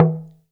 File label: TALK DRM, Heart of Africa; Spectrasonics